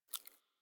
foley mouth move; Warframe; Catbrow

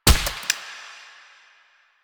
Shot Initial Mech, Weapons; Warframe; Acceltra Prime

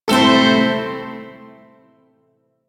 Maj Chord I; Shawzin; Warframe; Duviri Shawzin

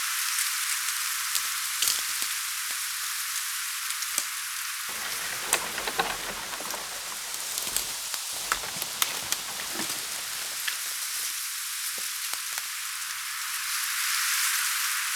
Tree Leaves Wind Loop; Trees

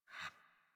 Puppy Idle Pant Short; Warframe; Kubrow

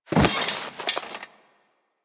Classroom Chair Sit; Ambience; Warframe; The New War; Quests; Zariman

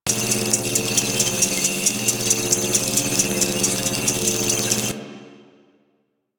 Timer Loop; Kahl Brother; Warframe; Veilbreaker; Devourer Kahl

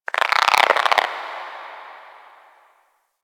Ocean Critter Frog; Warframe; Grineer Ocean

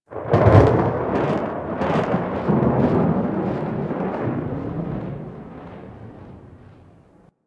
Hub Cephalon Suda Rand Thunder; Warframe; Ambience; Tenno; Syndicate Cephalon Suda

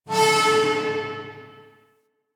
Galleon Metal Screech; Ambience; Warframe; Grineer Galleon

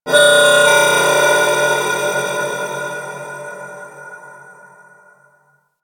Temple Moving Bells; Ambience; Warframe; Corpus Ship Remaster

